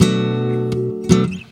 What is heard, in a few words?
GUIT CHORDS; Best Service; World Colours